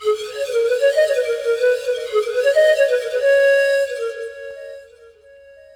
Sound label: FLUTE ETHNO 125